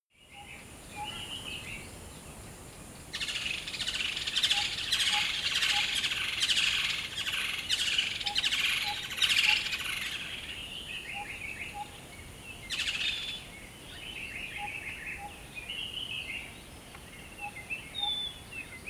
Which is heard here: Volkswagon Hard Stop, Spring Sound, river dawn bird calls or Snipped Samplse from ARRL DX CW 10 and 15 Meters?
river dawn bird calls